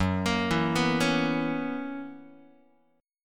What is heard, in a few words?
F sharp 11th F sharp Guitar; arpeggiated; JGuitar